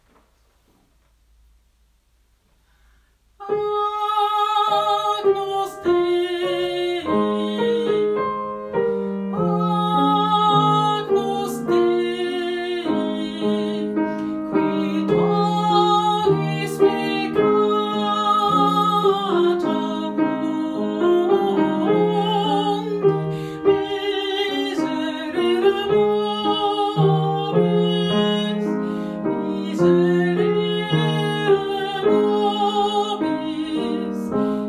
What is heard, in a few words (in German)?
Croce Agnus Alt